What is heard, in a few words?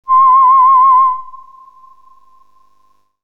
Whistle